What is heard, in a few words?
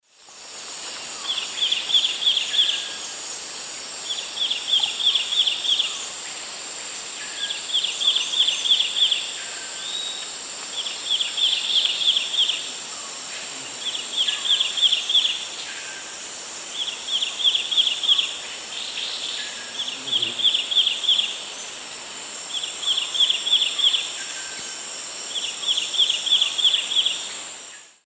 Hylophilus thoracicus, song; S. America; Eastern Lowlands